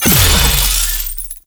Blast Charge; Warframe; Captain Vor; Abilities Redux

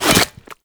Infested Fishing Spear Line Retract Catch; Warframe

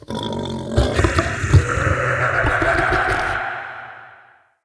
Corrupted Arena Crosser Taunt, Animals; Bromius

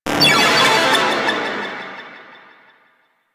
Arcade Fail; Nineteeen Ninety Nine; Snake Game

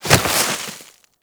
Generic Roll Snow; Warframe; Characters

